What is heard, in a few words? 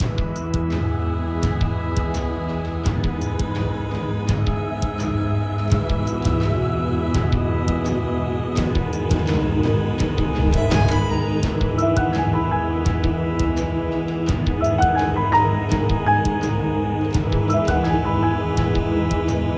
Caves Loop